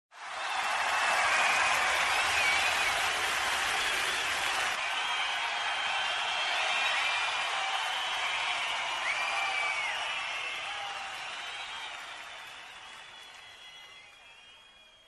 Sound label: crowd; Quicksilver; Drag Race